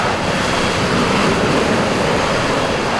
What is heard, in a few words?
rotor